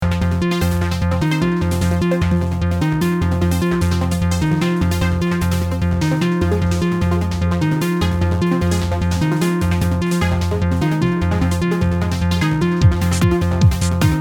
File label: Space Boss Battle